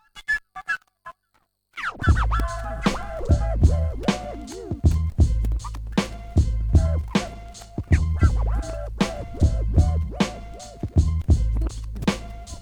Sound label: flute juggle scratch